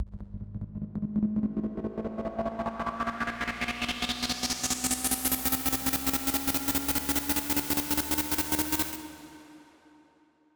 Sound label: Tone Riser (C2)